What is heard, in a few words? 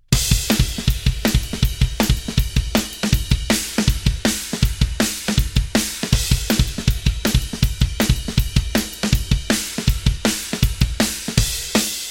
drums